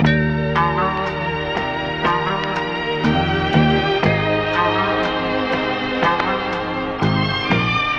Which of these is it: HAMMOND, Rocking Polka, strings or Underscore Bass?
strings